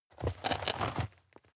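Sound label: step dirt heavy boots scuff hard, footsteps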